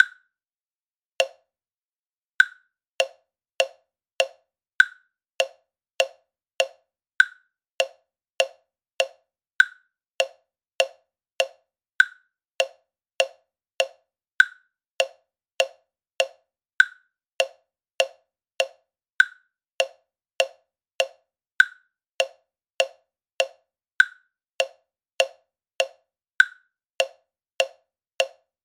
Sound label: Click Study Rock Swings, drum kit